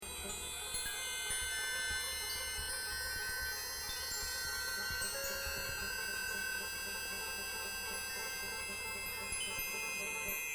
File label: bell loop